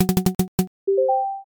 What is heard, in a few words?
roulette spin